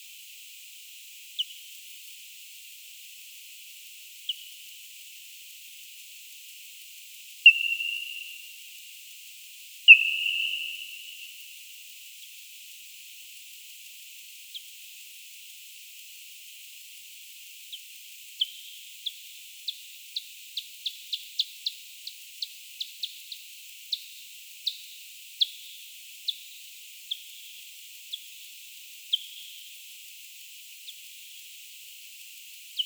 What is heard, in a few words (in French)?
N leisleri chasse Tenerife Las Lajas; J-Barataud